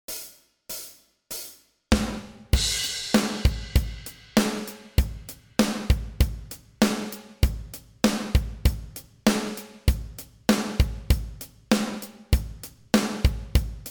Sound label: Straight Groove 98bpm